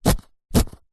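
mayonnaise plastic bottle empty squeeze just air slight squelch; food; zapsplat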